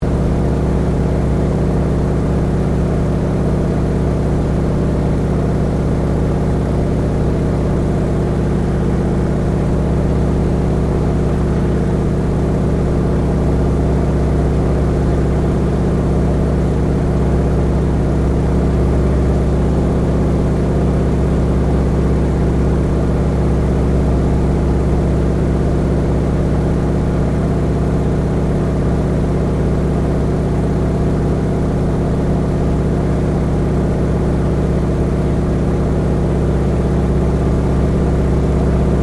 AIRCRAFT BOEING PT-17 STEARMAN FLYING LOOP INTERIOR, Trainer; 1939; Airplanes; Vehicles